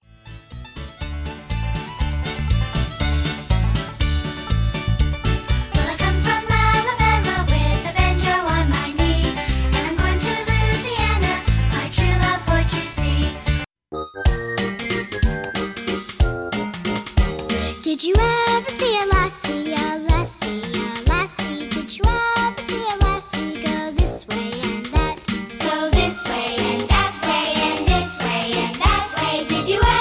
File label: CHILD